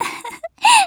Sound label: laugh; Eternal Return; Tia; JPN